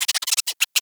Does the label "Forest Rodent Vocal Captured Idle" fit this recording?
Yes